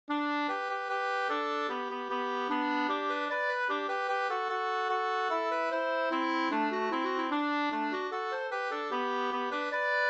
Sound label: mazurka; Sprattmazurka fr Gardhem Vg 2st